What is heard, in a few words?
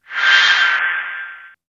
C5, Synth Line